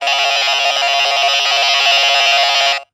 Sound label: Technology Electronic Noise